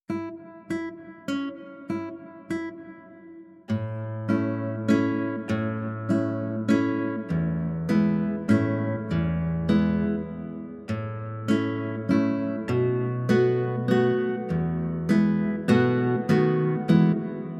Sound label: duets play along for violin and guitar; giuliani mauro; easy and pleasant